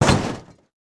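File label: metal destruction, Impact; Cars; XBOX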